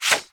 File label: Unsheath; Hollow Knight (gamerip) (2018)